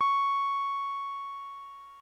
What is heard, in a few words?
bbm, Omnichord; OmnichordGodot